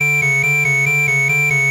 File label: alarm autoclave; antares; svencoop addon